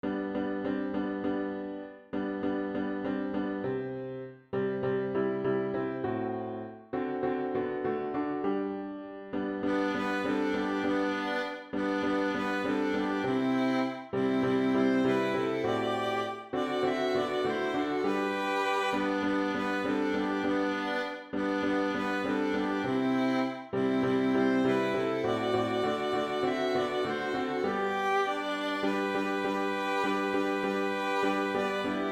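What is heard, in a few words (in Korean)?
징글벨 바이올린 듀엣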